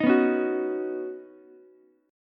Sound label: Db 7th Suspended 2nd Suspended 4th, Ukulele; strummed; chord shape; 8,11,9,9; JGuitar